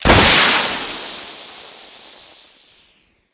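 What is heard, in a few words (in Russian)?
дальний прицельный выстрел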